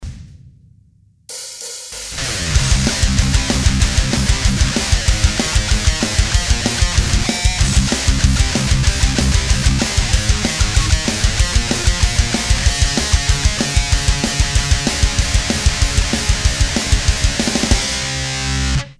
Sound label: riff